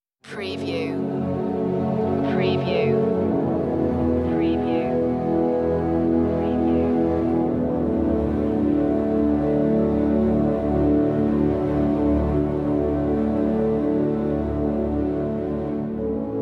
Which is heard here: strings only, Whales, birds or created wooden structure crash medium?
strings only